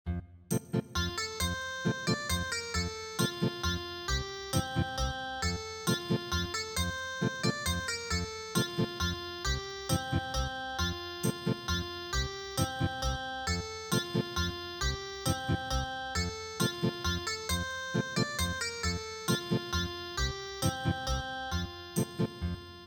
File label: Ethnic Guitar